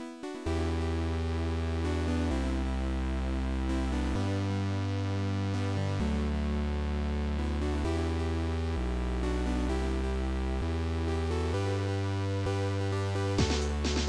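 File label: keygen song.xm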